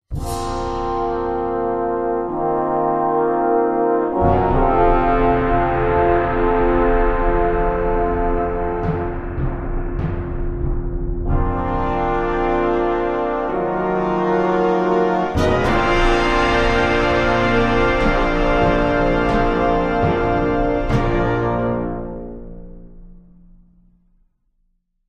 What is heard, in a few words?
fanfare, jingle